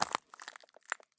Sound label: walk glass; Escape from Tarkov